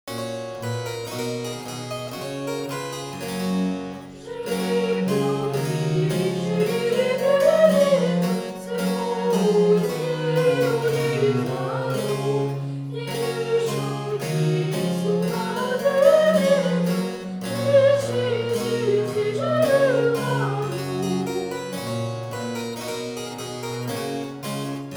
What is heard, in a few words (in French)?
le rosier vocal